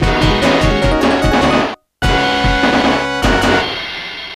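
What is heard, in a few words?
background music; Final Fight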